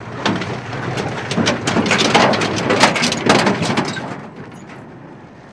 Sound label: Car Over Hill; hits & rattles